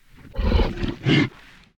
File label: Bullsquid idle; BUGBEAR